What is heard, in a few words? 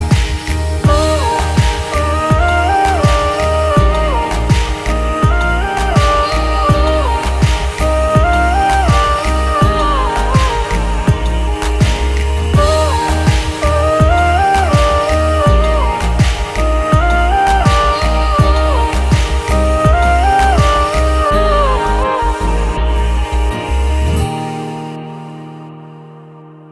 Instrumental